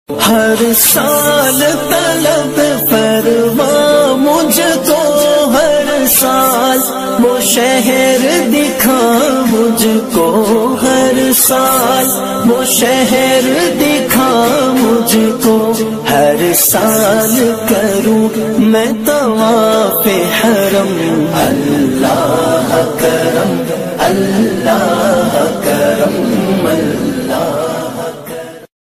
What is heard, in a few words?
attitude islamic ringtone naat arabic tune